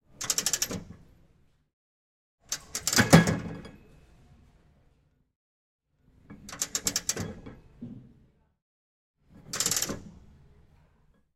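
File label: sounds of turnstile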